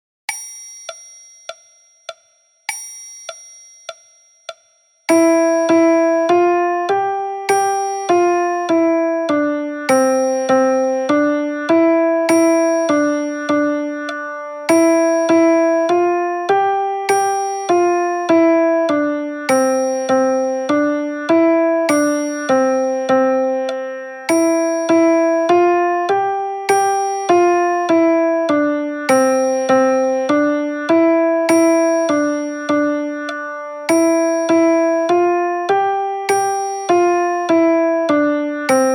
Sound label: Piano Version